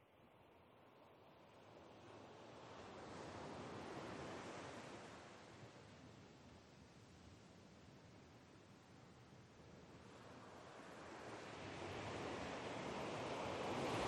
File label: wave; Sound design for the opera composer: concepts and methods; Her face was of flowers audio cues